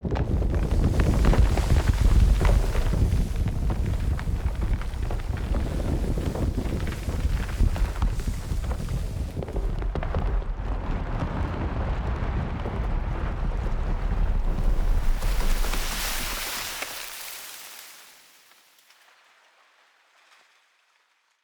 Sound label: Tree Growth Tree SFX; Cinematics; Bromius